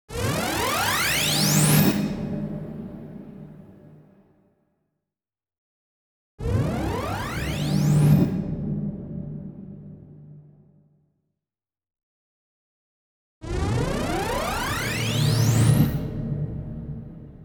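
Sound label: energy speed accumulates; speceffekti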